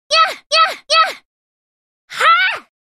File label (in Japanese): 「やっやっやっ！はー！」; スキル（サイクロンキック）